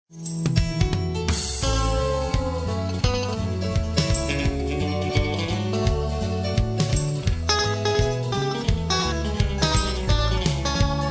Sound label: country